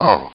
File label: hit; BattleSNDS